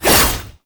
Boss Melee Whoosh; Enemies; Abilities; Warframe; Oraxia

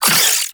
Low Clip; Weapons; Warframe; Tenno; Oraxia

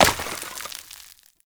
Destroy Planter Hanging; Warframe; Ambience; NineteenNinetyNine

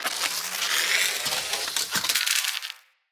Punch Wind Up; Weapons; Unarmed

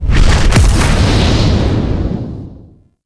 Spawn; Warframe; Veilbreaker; Sprag

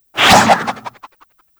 Grapple Throw; Warframe; Warframes; Berserker

